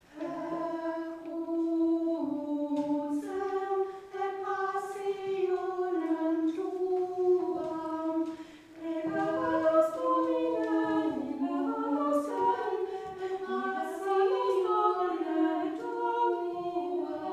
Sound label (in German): Chor; Per crucem; Gottesdienste zum Nachhören